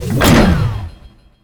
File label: Gun Ammo Pickup, Weapons Foley; SFX Kit